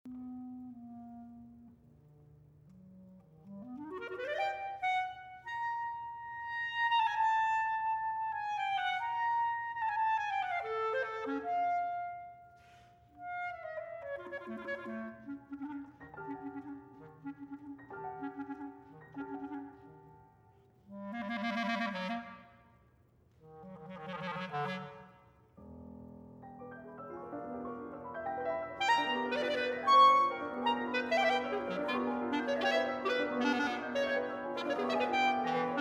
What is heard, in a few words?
Cadenza; Clarinet and Piano Performance